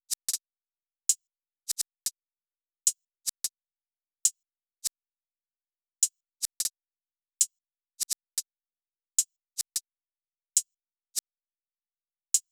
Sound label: Drum Loop, 152 BPM Hihat; Cymatics; Vibes II Drum Loop Collection; Defendant Drum Loop